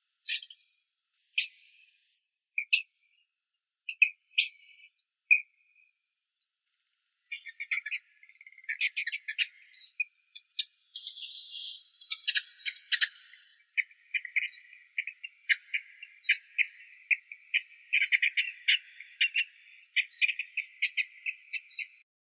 polevka ekonomka microtus oeconomus; zvuki prirodi

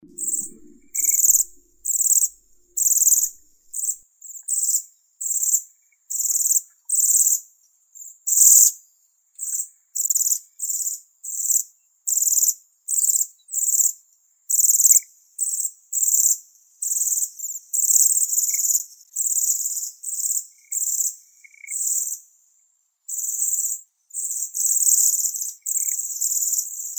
sviristel obiknovennii bombycilla garrulus, zvuki prirodi

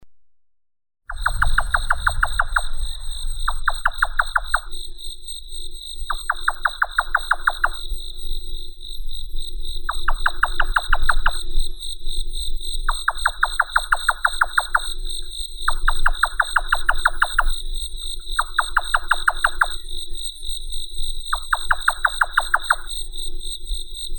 kozodoi bolshoi caprimulgus indicus

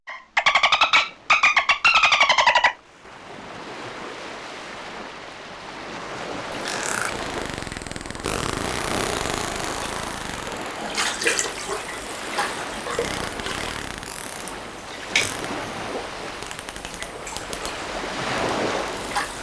delfina slishen klich

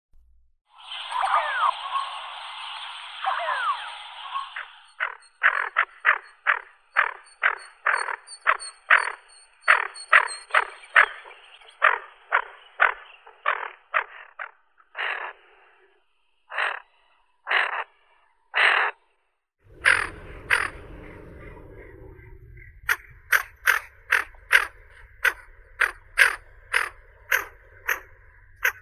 chernet morskaya aythya marila

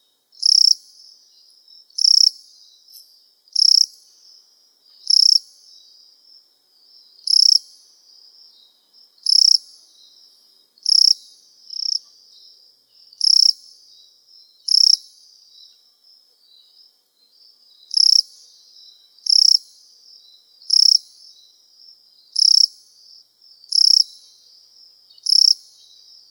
dnevnie zvuki sverchka

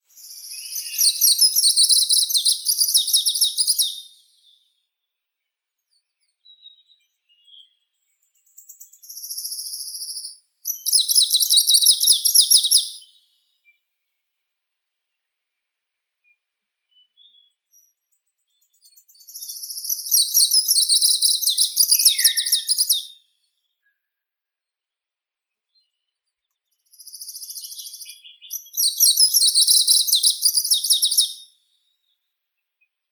penochka zelenaya phylloscopus trochiloides

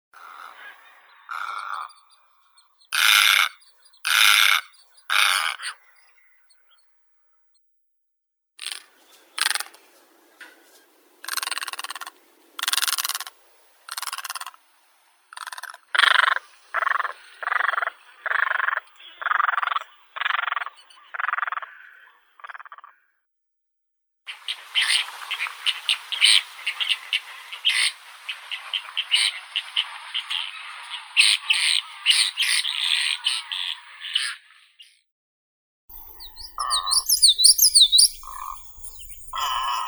tsaplya belaya bolshaya egretta alba, zvuki prirodi

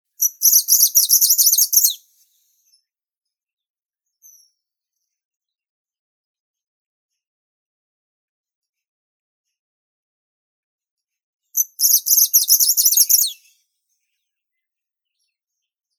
penochka zelenaya phylloscopus trochiloides

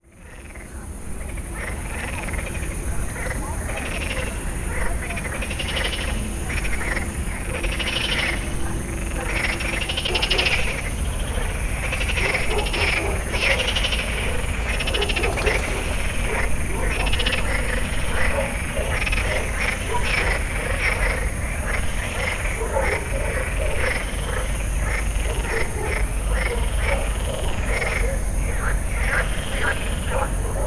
noch na beregu ozera

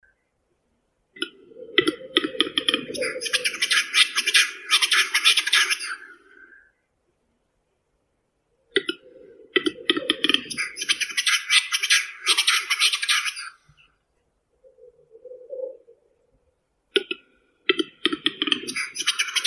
gluhar tetrao urogallus

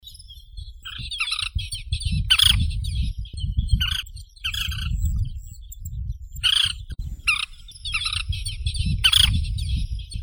zvuki prirodi tirkushka vostochnaya glareola maldivarum

